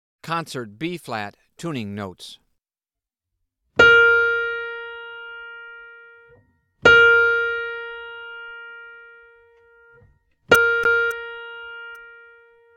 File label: Tuning Notes